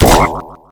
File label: frog jump; garrysmod